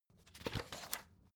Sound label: folder page turning